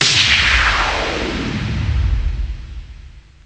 whooshdown; Weird and Interesting Hits; single hits

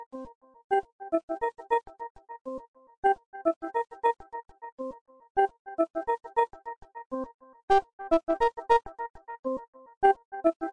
mellow bleepy

